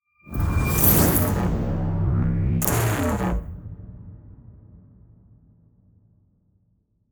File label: MAX METAL DOUBLE HIT; WNF ANALOG LIBRARY